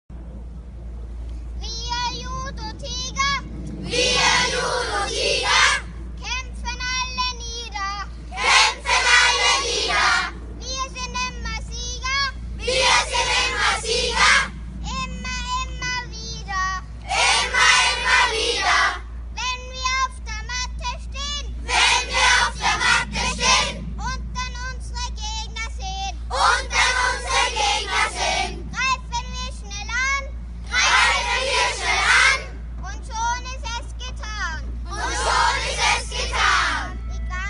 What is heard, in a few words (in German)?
Judotiger Song